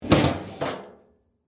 Trash Can Lid Open, Household, Trash, Can, Lid, Open, Metal, Garbage, Can; BlastWave